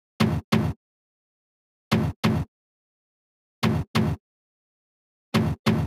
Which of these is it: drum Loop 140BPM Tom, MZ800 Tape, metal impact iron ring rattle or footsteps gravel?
drum Loop 140BPM Tom